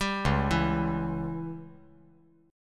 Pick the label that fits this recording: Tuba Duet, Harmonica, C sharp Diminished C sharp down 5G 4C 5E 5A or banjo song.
C sharp Diminished C sharp down 5G 4C 5E 5A